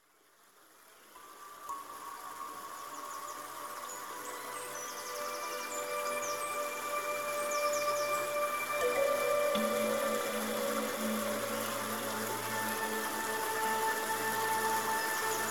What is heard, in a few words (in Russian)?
zvuki lesa